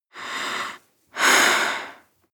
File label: breath female breath deep